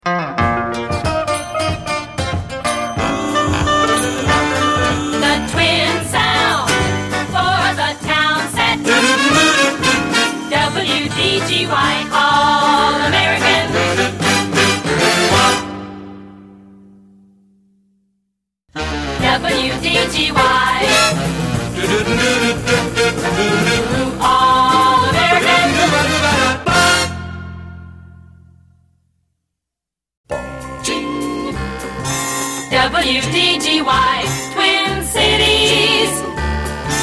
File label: All American Jingles; WDGY AM; 1965